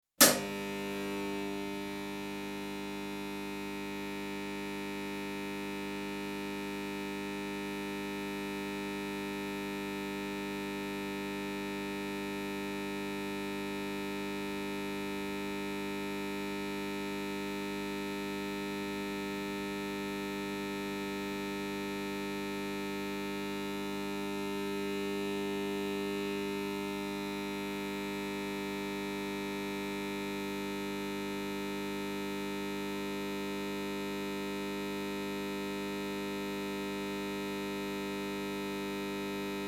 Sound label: Arc Welding Machine, Switch On; Construction; BBC Sound Effects Library